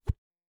Punch Swoosh Thud; Hit Whooshes

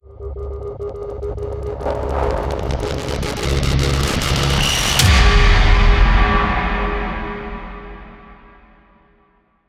Tension Builder Impact

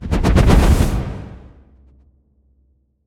Wobble Whoosh High; Whooshes

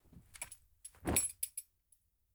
Jeans, Clothes

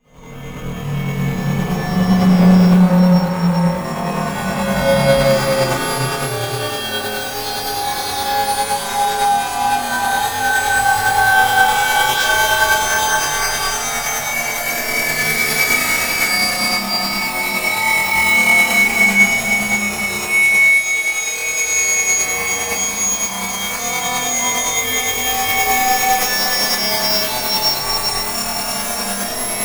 Digital Ambience; Cinematic

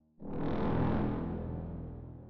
Dramatic Brass

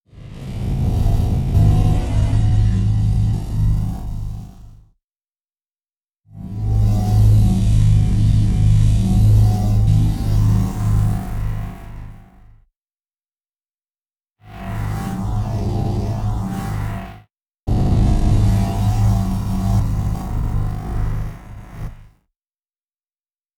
Whoosh Humming Wave Large; Whooshes

